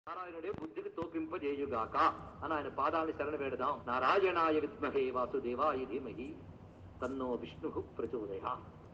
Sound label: narayana mantram